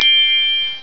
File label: Wonderful Ding; PC; MARIOSFUNDAMENTALS